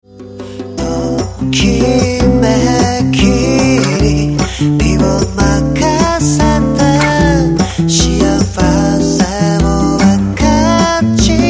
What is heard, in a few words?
happy samba aco